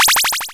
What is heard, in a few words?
powerup; cabin-fever